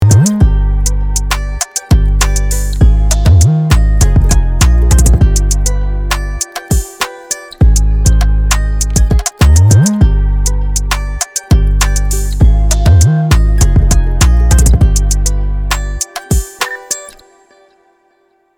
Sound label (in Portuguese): Beat Fechado; instrumental; grave do conxa